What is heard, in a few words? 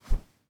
throw